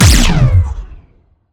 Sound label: Sciweap Shot Large Laser Astral Disintegrator; gamerip